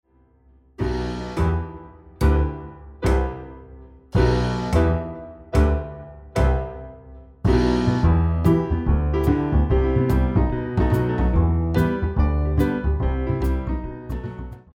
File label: Jazz Accompaniment